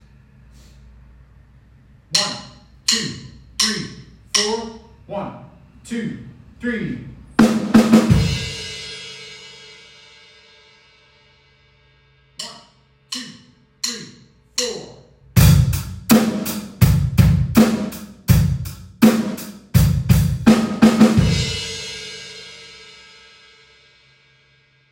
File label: starter fills, drums